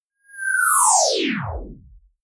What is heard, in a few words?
screen shut down